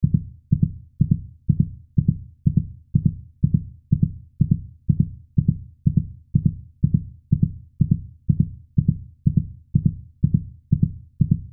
Heart Beat Very, Heart Beat Very Fast Speed Human Loop; Hospital; Emergency